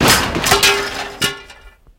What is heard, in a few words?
fence metal fall; tanks; War Thunder